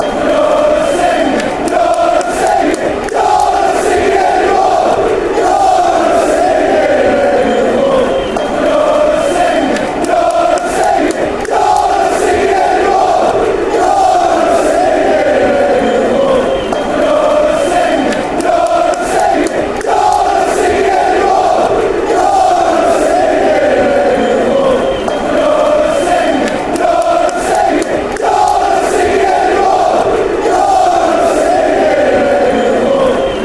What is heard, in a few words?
youre not singing anymore villa fanchants